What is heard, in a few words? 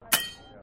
warning; gwent classic v4.0